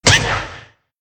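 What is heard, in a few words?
meerkat damage, monster; Senillinea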